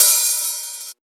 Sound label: Old Skool House Open Hat